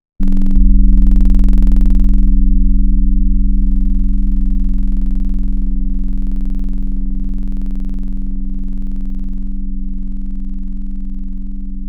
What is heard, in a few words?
coin, Serum Wavetables; Synthetic's Serum Collection; Surge Sounds - ASTRO - Serum Wavetables